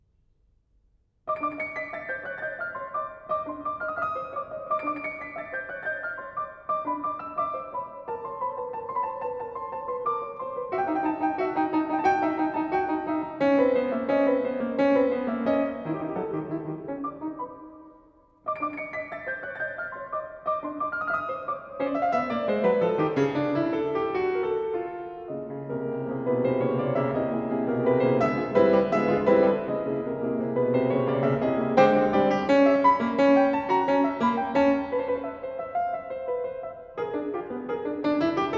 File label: Nocturnes for piano; Nocturnes (8); Poulenc